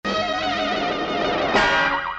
sonido de golpe

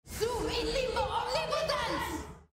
grito

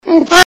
wuajajajaja; ranita

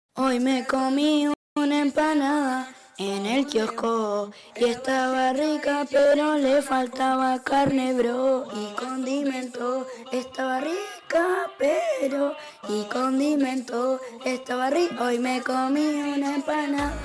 muzica; empanada

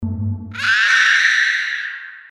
grito primal

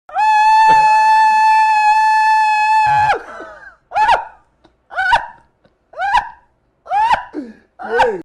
risa

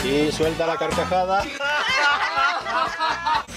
y suelta la carcajada

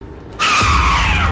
grito

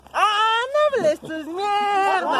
videos de risa, aaaaaaaaahh

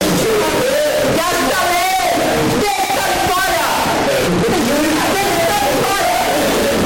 grito de ayuda extremo

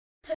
risa fea

